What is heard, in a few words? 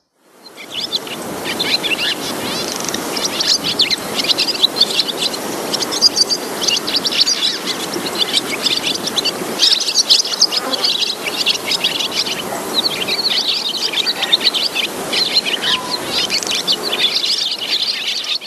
chimney swallow